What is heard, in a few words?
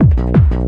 beat